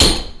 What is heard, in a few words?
axe metal